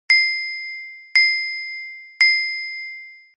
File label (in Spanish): sonido triple campanilla para whatsapp